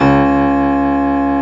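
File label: reverse C#1, piano; AKAI S-Series CD-ROM Sound Library VOL-2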